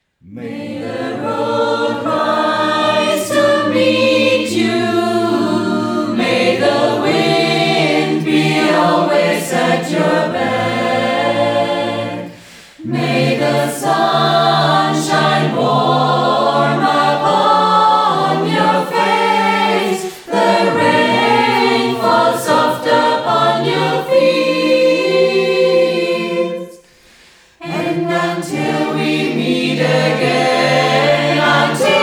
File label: Irish Blessing, Gospelchor